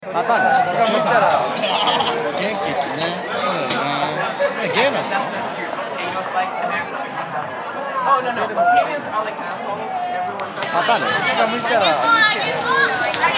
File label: Ni-Chome Noise Symphony